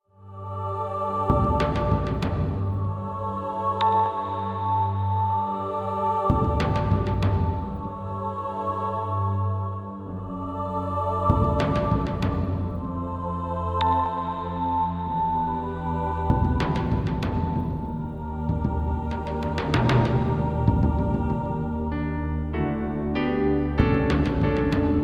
Instrumental-Weihnachtsmedley

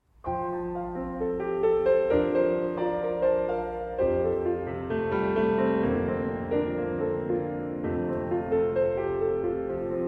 Chor

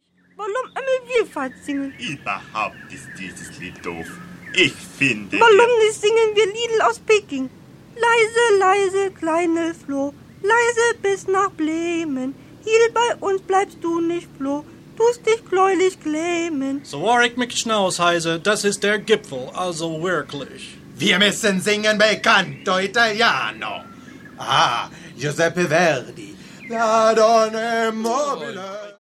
Chorprobe

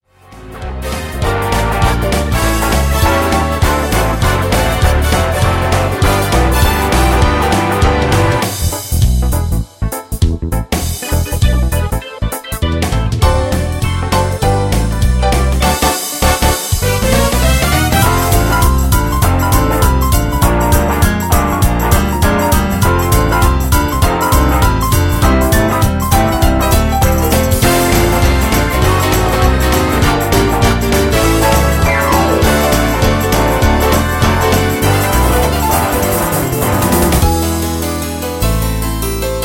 Powermix ohne Backings

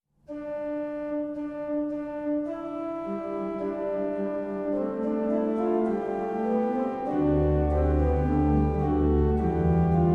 Orgel; Scheidt

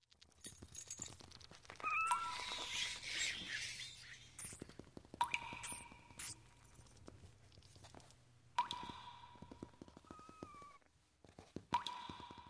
Gefaengnisatmosphaere

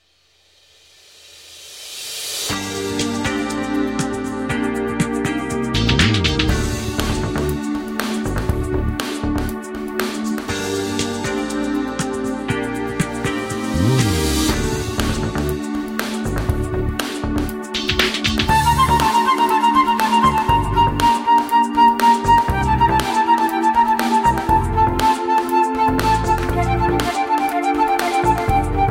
Floetenkonzert G-Dur Allegro; Floetenkonzert G-Dur La Notte Op 10 Nr 2-Allegro

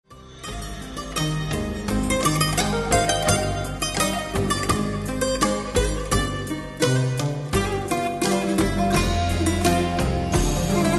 Hoerszene-Playback

